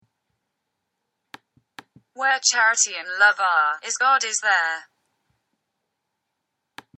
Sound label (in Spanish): Ingles; Ubi caritas